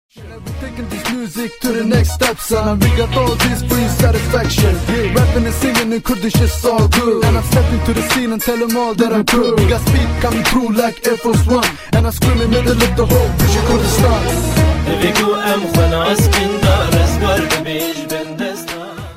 Rap

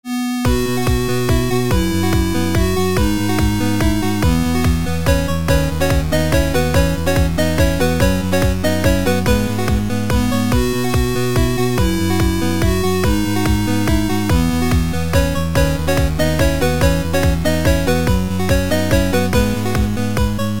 8bit; 7 Deadly Sins

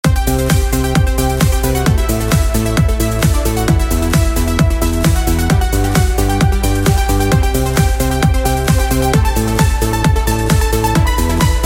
Nokia 1208 Loop

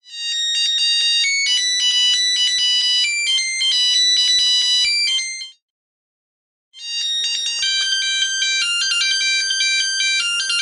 Wake Up Alarm

